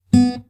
txqueue stop; Instant Messaging; TeamTalk V 5.5 Portable

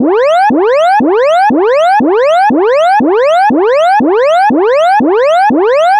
Emergency Alien Fast Alert

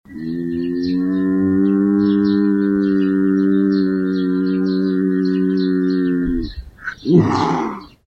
lynx, Forest

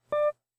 vox enable; Instant Messaging; TeamTalk V 5.5 Portable

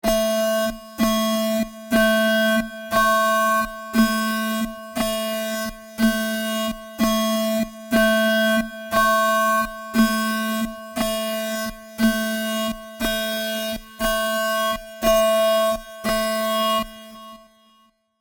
Sci-Fi Alarm Large Room

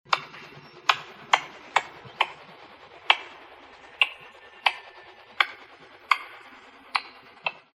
squirrel, Forest

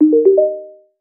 broadcast msg; Instant Messaging; TeamTalk V 5.5 Portable

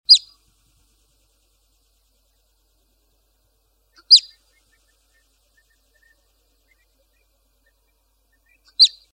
marmot, Nature

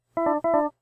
vox me enable; Instant Messaging; TeamTalk V 5.5 Portable

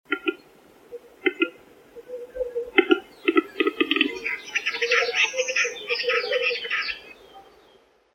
woodgrouse, Forest

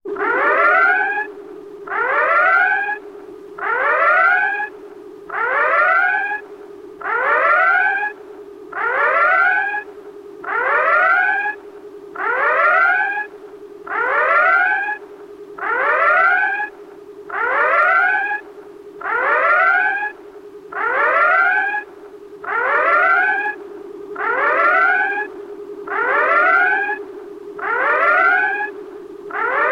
Science Fiction Alarm Sci-Fi Red Alert Siren; Science Fiction SFX

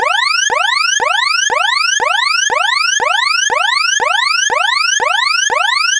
Heavy Alarm Fast Siren

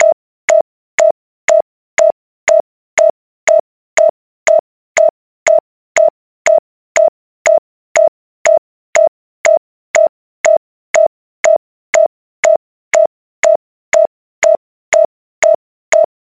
Alarm Low Electronic Button Alert Space Alarm

